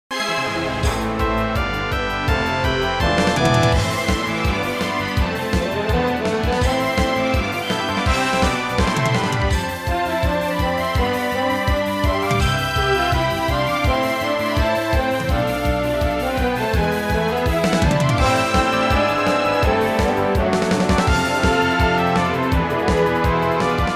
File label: music quest battle